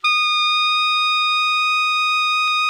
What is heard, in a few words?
ALTO MF D#5, Sax; Greytsounds; Philip Wolfe David Crozier's Saxophones